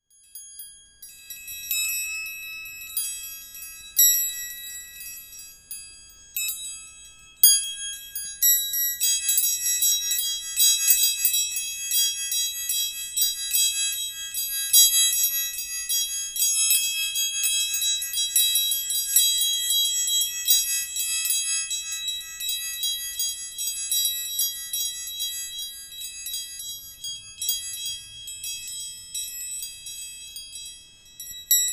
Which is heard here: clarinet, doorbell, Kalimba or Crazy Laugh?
doorbell